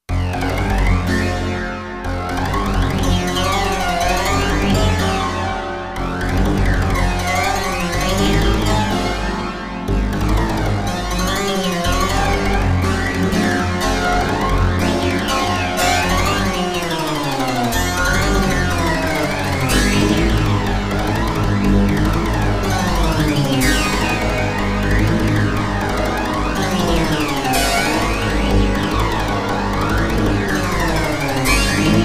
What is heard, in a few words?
19ET Synthesizer